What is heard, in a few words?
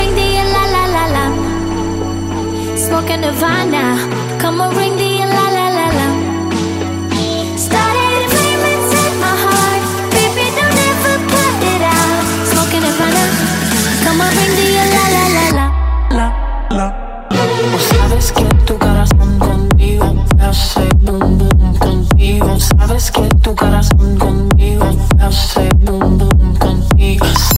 Turkish electro ringtone